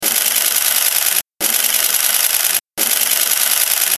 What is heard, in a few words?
shutter; 1Ds MkIII; digital